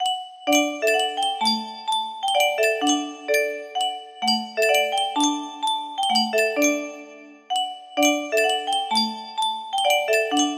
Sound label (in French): Yunsheng Boite a Musique